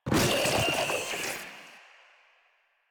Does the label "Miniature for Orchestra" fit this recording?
No